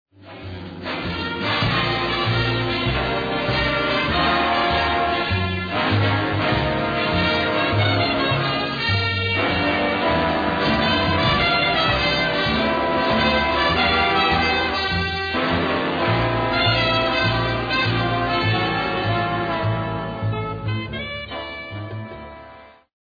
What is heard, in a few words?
Blues; The World of Suzie Wong